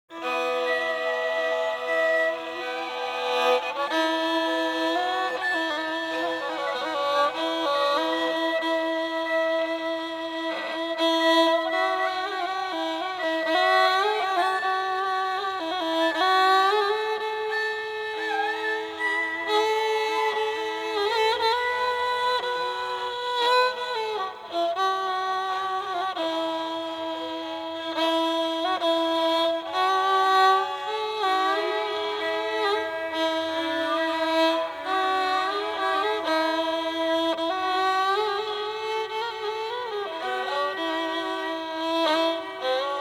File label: rebab, gamelan